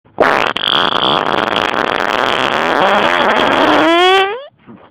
Subida, pedo

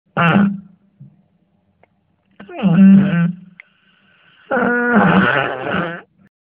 Gaseoso, pedo

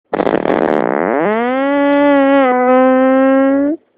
Pedo nítido

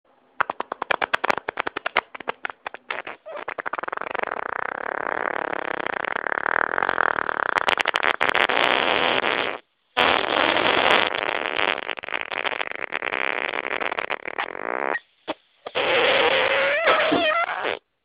Pedocóptero

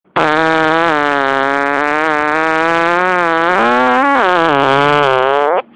Pedo de furgoneta

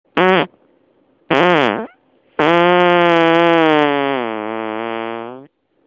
Pedo moto

